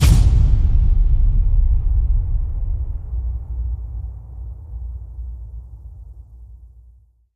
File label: Hit Big Warning Shot